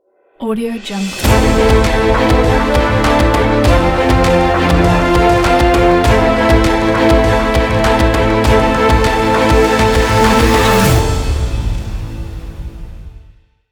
breaking news logo

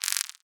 screen grit; soundmorph

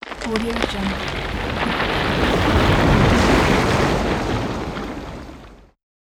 iceberg crashing; magicsoundeffects

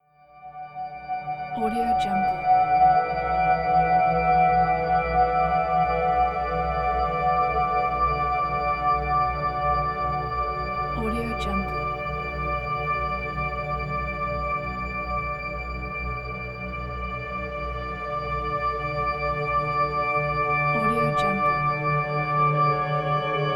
melodic soundscapes pack

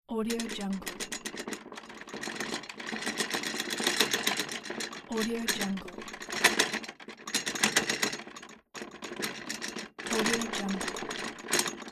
rattle

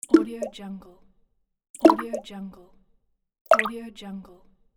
drip

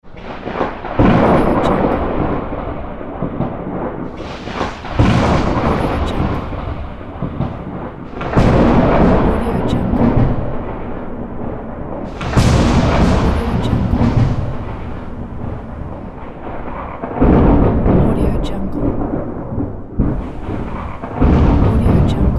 thunder sound pack

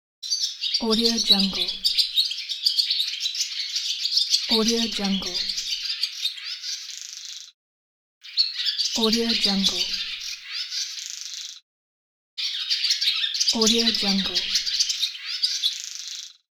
swallow bird

